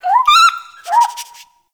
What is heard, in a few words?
Rabbit Ambient Call; Warframe; Duviri